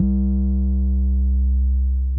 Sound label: Drum BPM110; Martian Chess